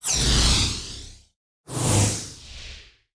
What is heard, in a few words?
Solo Whoosh; Yu-Gi-Oh Master Duel